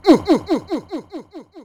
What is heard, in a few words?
wah-wah; LastCall